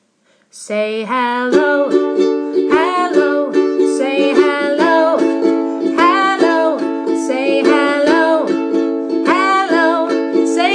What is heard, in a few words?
Say Hello